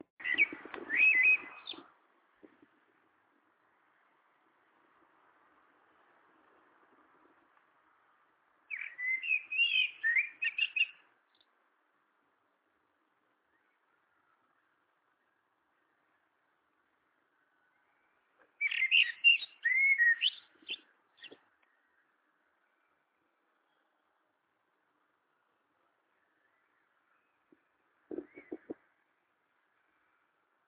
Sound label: blackbird song